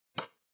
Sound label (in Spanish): Golpe